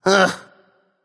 nv ugh; smite; voicepack; ganesha

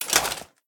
mvt armor arms; smite; god arthur

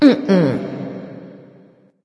disagree light; smite; voicepack; hel

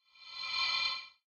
idle spell; smite; god ganesha skin11

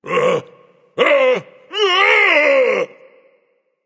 fear, voice; Smite; ymir skin14

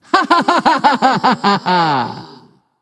"yay"; smite; voicepack; nuwa

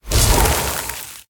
cast ice; smite; god merlin

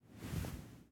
armor; smite; anhur skin13

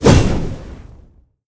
swing buff; smite; gilgamesh

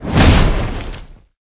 explode; smite; god sol

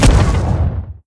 punch; smite; cabrakan

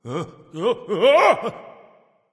fear; chaac

